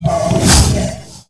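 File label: creature dune lizard attack heavy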